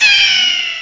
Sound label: aaah; Groovy Bytes: Behind the Moon; VOCALS